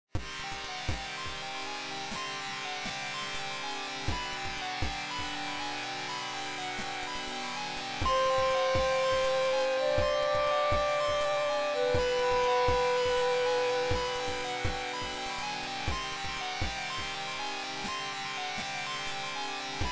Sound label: Drumtest